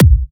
Bassdrums; Vengeance Samples

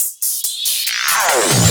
Loops Fills; Vengeance Samples

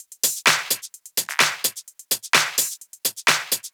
Drumloops 128BPM

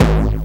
BD Distortion, Bassdrums; Vengeance Samples

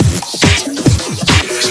Breakbeat; Vengeance Samples; VEC2 Loops

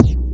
swell hit; DnB; Breaks N Beats Sound Kit; DnB Kit 1

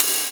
Cymbals Ride; Vengeance Samples

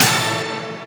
Synths; Vengeance Samples

